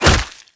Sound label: attack hit, zombie; creatures